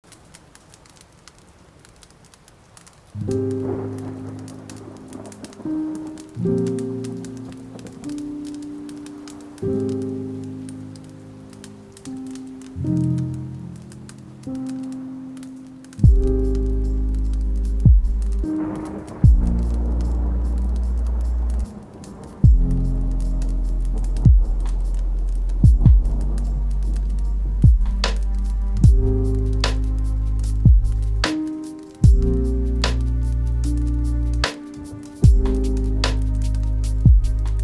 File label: Thunderstorm, lofi hip hop - beats to relax study